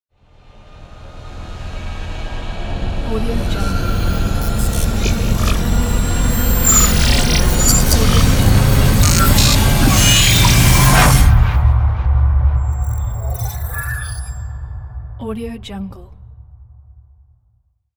glitch riser

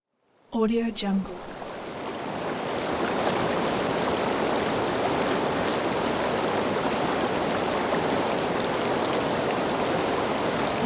big river flow; capeaudio

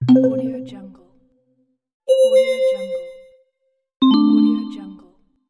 notification

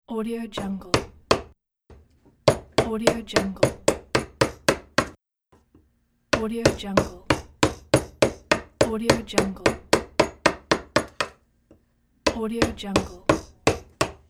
hummer knock

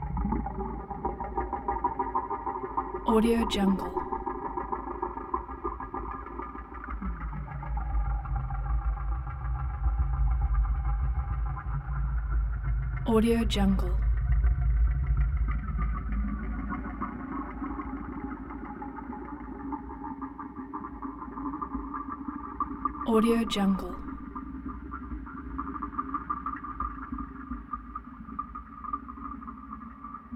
vibrato ambience; prosoundfx

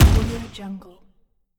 small explosion impact

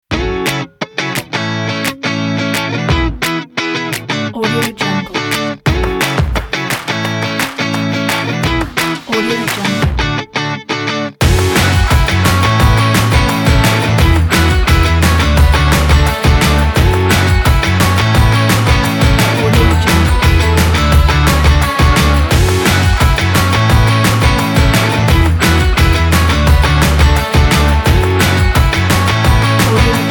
pop rock

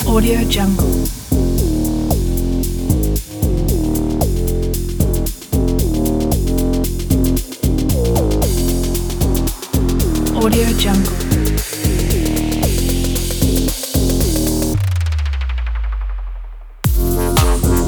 tech house

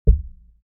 human body heart beat single high; smartsoundfx

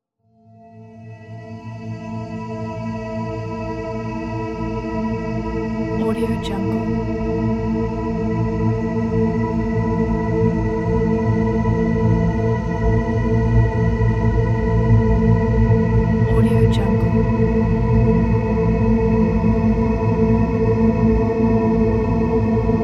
horror background ambience soundscape

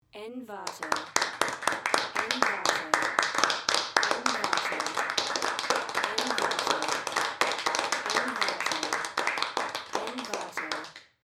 applause very small crowd indoor

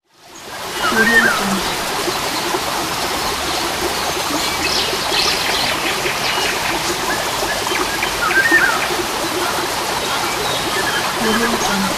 birds by the stream; chicasound

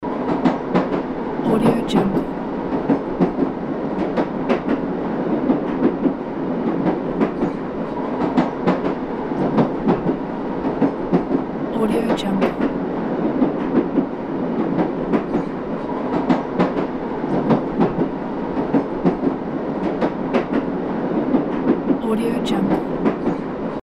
interior train loop; davidbeardmusicproduction

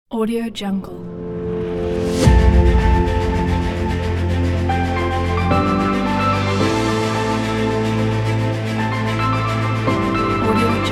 motivational epic powerful

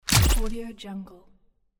camera flash

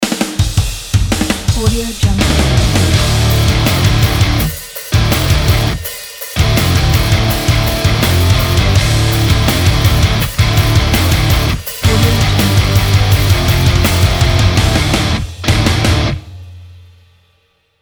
metal logo

